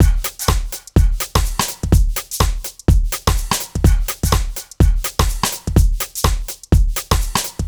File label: Full Loop 125, Loungin House; Equipped Music